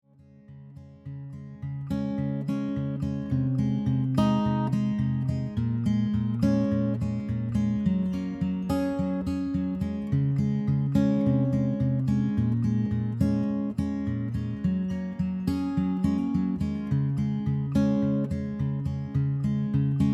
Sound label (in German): gitarre percussion